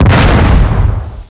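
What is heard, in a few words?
explode; Pro Android Games; Wolf3D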